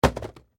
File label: Cardboard Box Dropped Foley Small Package; Miscellaneous Impact; Impacts; BBFX